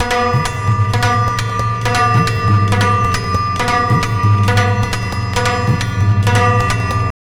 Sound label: Tabla, 135 BPM; Zero-G; Pure Tabla